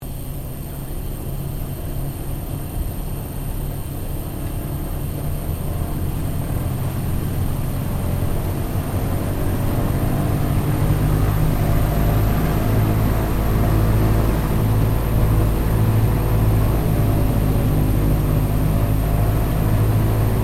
AIRCRAFT LOCKHEED HUDSON PREFLIGHT INTERIOR, twin engine light bomber; 1941; Airplanes; Vehicles